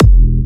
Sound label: kick